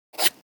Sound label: Pencil underlining on paper quick swipe